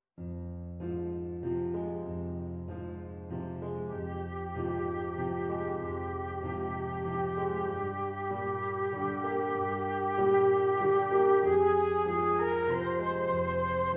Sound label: flute Vignette